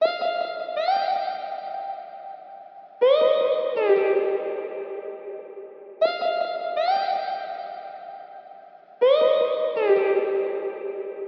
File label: 160 BPM C Min Moog One Vocal Lead; Stems; Cymatics; Aquamarine